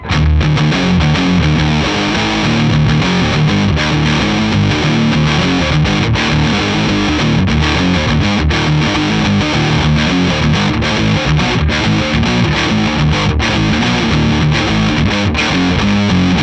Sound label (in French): sature les paul; egnater